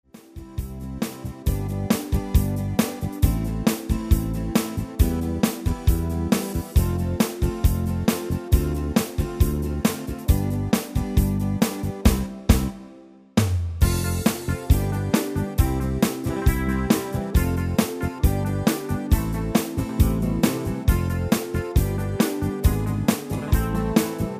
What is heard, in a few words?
Moonlight Beat, tyros2; Dansk GrandPrix